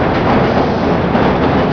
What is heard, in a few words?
train